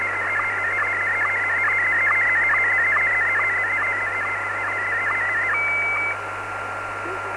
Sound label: sstv